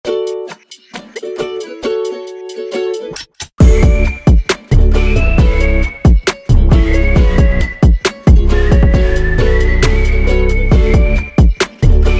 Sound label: Ukulele Boom